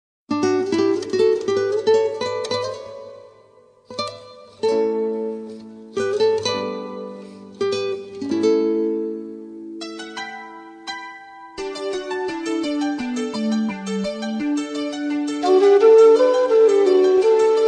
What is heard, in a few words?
Okarina